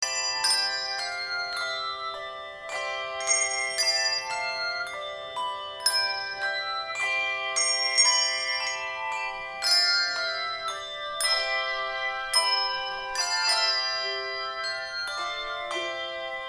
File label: HANDBELLS JOY TO THE WORLD